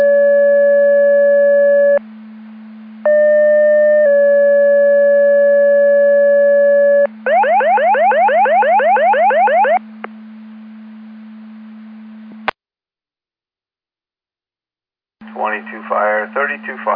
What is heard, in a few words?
Siren; Andover Twp Boro